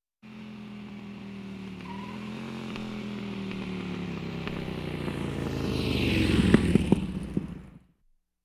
näherkommendes, stoppendes motorrad; Auto-Zweiraeder

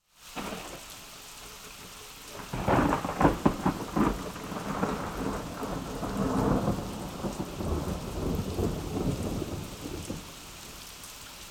donner und regen in der stadt; Großstadtgeraeusche

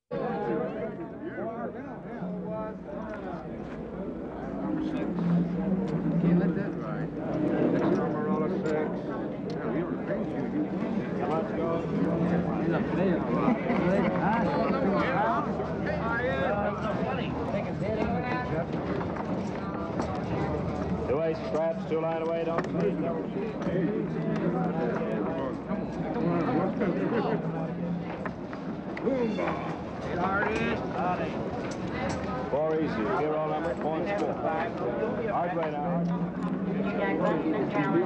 würfeltisch; Gluecksspiel

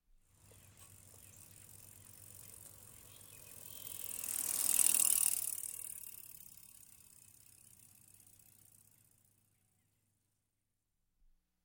vorbeifahrendes fahrrad; Auto-Zweiraeder

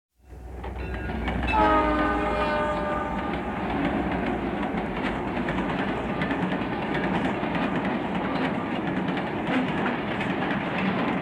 diesellokomotive vorbeifahrend; Bahnhof

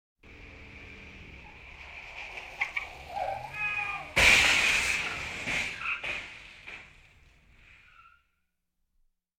motorhaube schließen; Auto-Zweiraeder

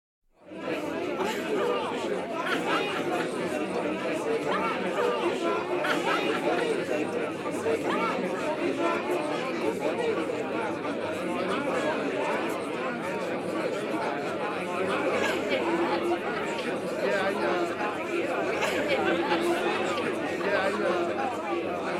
partygesellschaft; Feste-Feiern

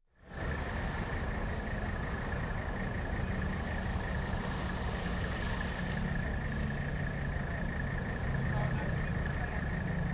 hauptstraßenatmosphäre; Großstadtgeraeusche

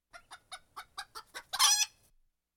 eierlegendes huhn; Auf dem Land

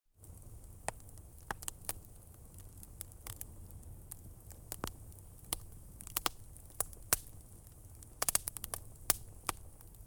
lagerfeuer; Auf dem Land

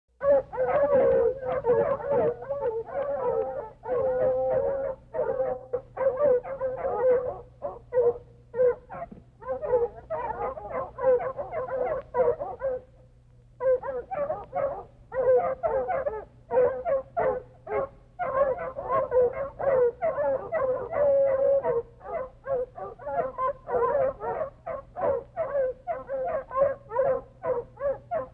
kläffende jagdhunde; Auf dem Land

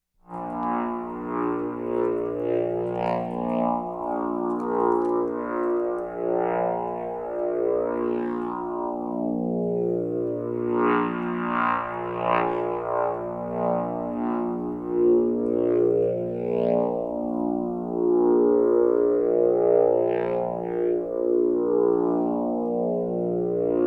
rotierender klang, schwebend; Science Fiction

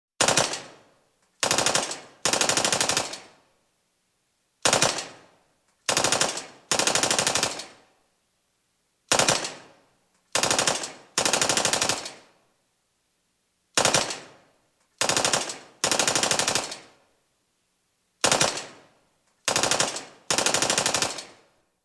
maschinengewehr; Katastrophen

